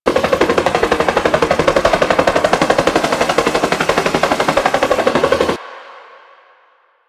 Sniper Drone Propeller Loop; Enemies; Drones; Warframe; Lasrian

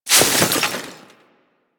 Melee Impact Snow; Warframe; Weapons

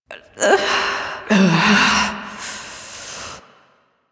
Fight Breath; Cinematics; Warframe; The New War; Throne Room

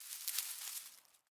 Forest Open Glade Grass Move Close, Day; Ambience

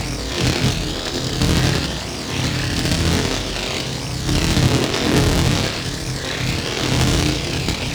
Shield Loop; Warframe; Eximus; Guardian

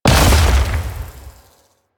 Barrier Impact; Warframe; Brawler

